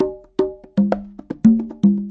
090 bongos, 090 bpm; Bazillions of Bongos

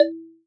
melbourne bounce Bell; Hip Hop; STYLE KITS; D3ron Melbourne Bounce Kit

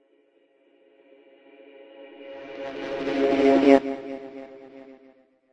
up and down again, pain pack sweeps and fx; REMIXABLE COLLECTIONS; Ze Franks Pain Pack

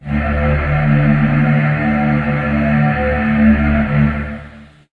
M1 Mellotron Vox; Pads

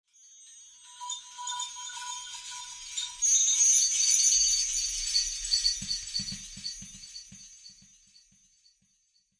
chime echoes, Atmospherics

